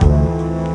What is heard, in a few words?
Ummm Stringz; Mo' Phatt; Digital Sound Factory Mo' Phatt